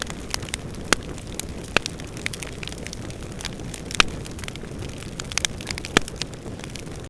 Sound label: Flare; ambient; light